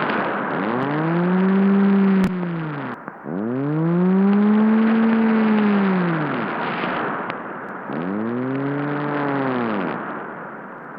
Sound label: Far East carrier and grunt on 21000 kHz A3E